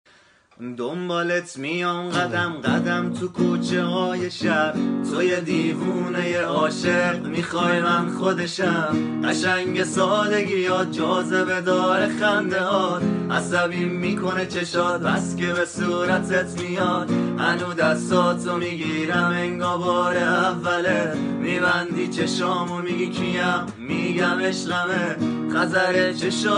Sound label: Guitar